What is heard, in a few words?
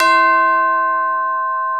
TUBULAR C4; InVision Interactive; Lightware VOL-1